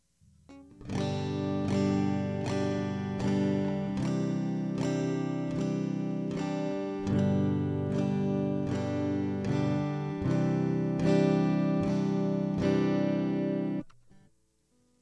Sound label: strumming quarter beats G Cadd9, guitar